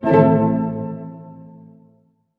HARP HITS A MIN; Sound On Sound - Peter Siedleczek's Orchestra

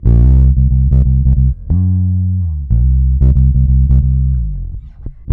C, bass; Spectrasonics; Bass Legends CD 1; S.BRONX

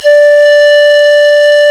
pan flute; Sound & Vision - Gigapack I CD 2 (Roland); Brass&Woods2

